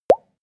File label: pop bubbles deep; soundpool